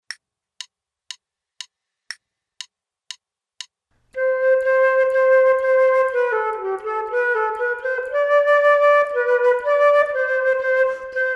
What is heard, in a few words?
Duets flute; Selected Duets for Flute Podcast